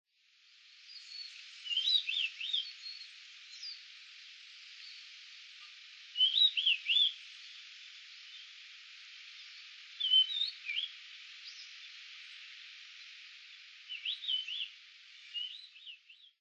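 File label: higegabi, Bearded Reedling; kochi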